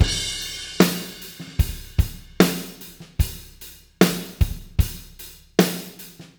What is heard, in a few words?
Beat Loops; Zero-G; Monster Beats